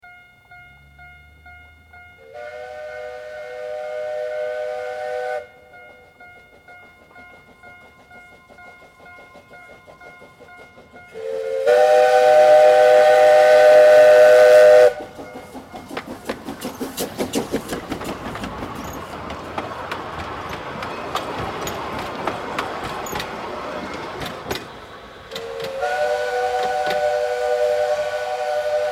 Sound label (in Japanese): SL 汽笛 通過